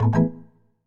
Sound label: Device Connect